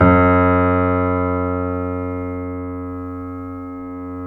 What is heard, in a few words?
C7 PP, The Ultimate Piano Collection; East West